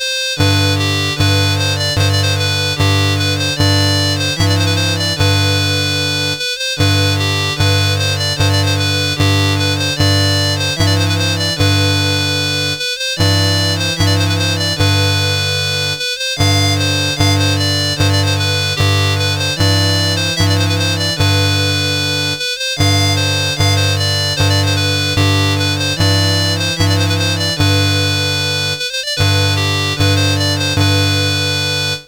Bhutan, National Anthems, CSG8580R5

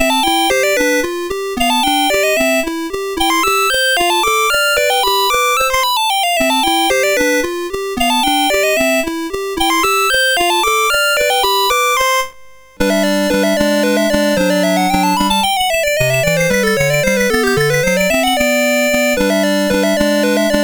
music box, mus MOS6581R2

